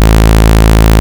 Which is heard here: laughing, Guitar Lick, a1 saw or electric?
a1 saw